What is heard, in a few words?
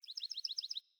Bird Song; Paragon Bird Guide